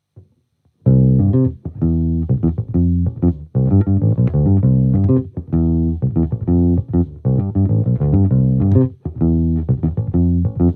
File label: acoustic image, bass